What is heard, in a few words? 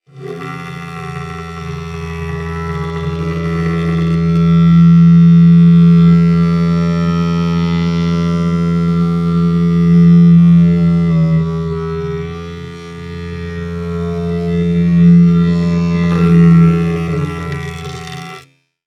Technology Electronic Trimmer Resonate On Hollow Surface